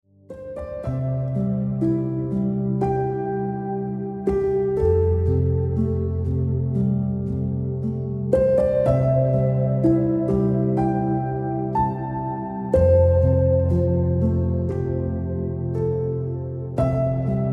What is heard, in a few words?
Just You Ringtone Piano Music